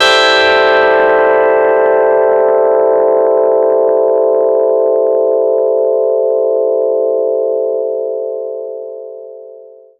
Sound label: smooth crystal guitar